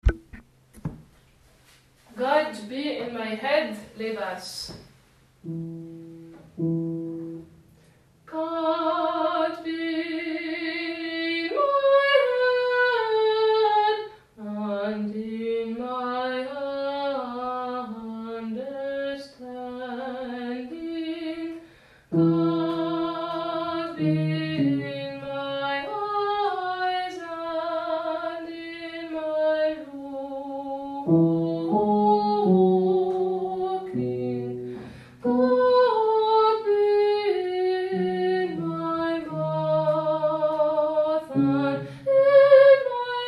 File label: basse; God be in my head